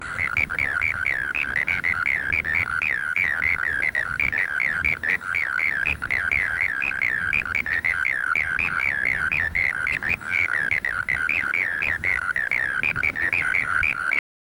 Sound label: futuristic frog in space, aliens